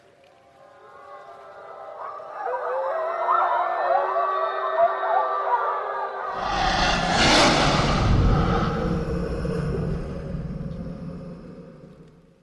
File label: wolfpack